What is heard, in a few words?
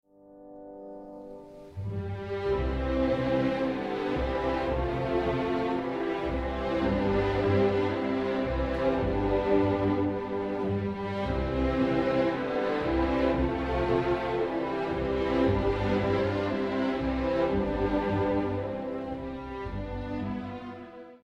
Brahms 1 bowing, strings; orchestration introduction to strings